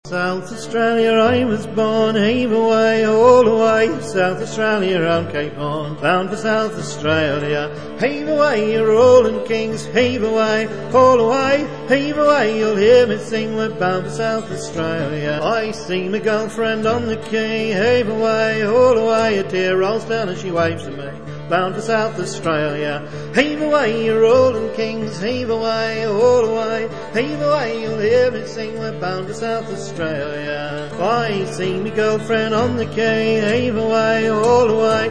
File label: South Australia - trad - sung